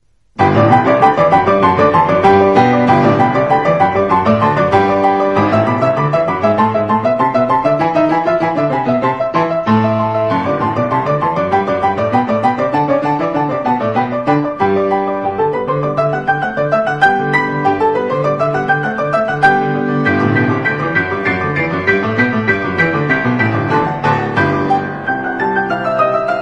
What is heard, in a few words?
bgm